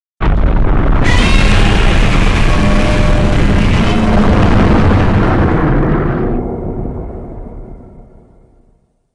Roar; Godzilla Earth

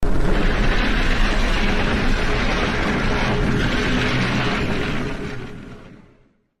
Mammoth Roar; Nezura

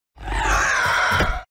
Nightboy roar

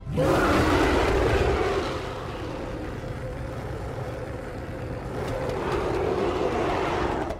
King Kong roar; 2005movie

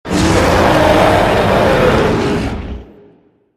King Kong roar

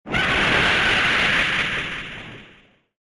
roar; Barakidon

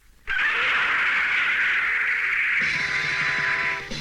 Roar; Gorosaurus 1972

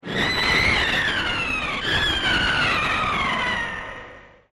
roar; Balgaras orb

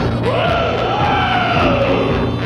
Stone Creatures Roar; Power Hour

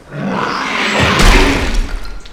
Grub roar; Rockclaw

